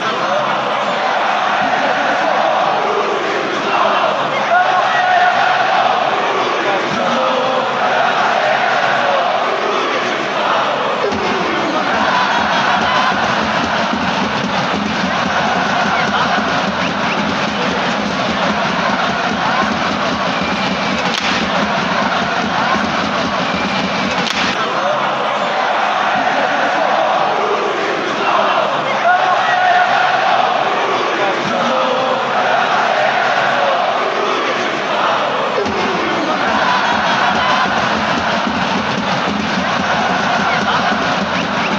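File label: chant fanchants, football chants; de marseille a janeiro luiz gustavo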